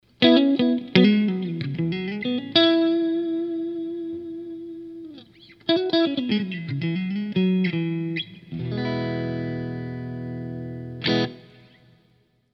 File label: dirtroad middle neck, strats; pickups